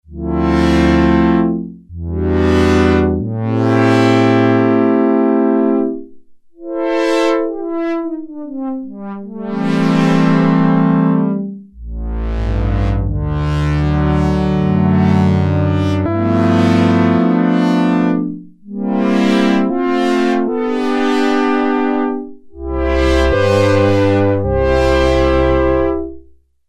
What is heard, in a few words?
Slow Brass; Sequential Circuits Prophet-5 (Ver.3); Factory Patch; File 3 Programs